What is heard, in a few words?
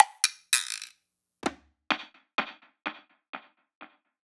percussion oneshot complex; Pop; Drums; SD PASADENA Pop Sample Pack; Slate